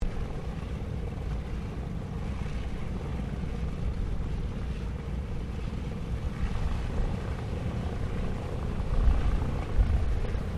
AIRCRAFT LOCKHEED L-1049 SUPER CONSELLATION TAXI WASH; Connie, Super Constellation; 1955; Airplanes